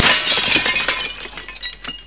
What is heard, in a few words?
SMASHED, breken